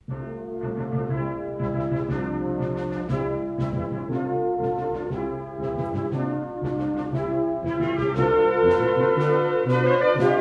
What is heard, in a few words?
Anthem long version; FAI